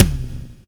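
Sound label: bass drum, left; Zero-G - Chemical Beats; BASSDRUM ZOO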